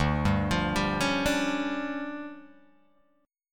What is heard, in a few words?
D Minor Major 7th Double Flat 5th, D, Guitar Open G